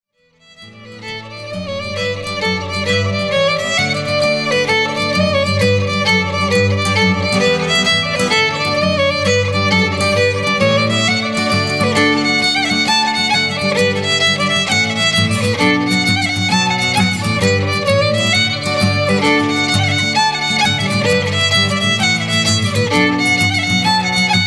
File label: Jig Of Slurs Dance Piper